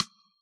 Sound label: side stick, Yamaha DJX Rock Kit